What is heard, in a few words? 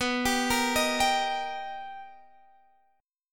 B Augmented Major 7th B Guitar Standard; arpeggiated; capo 3; 19,22,20,20,20,x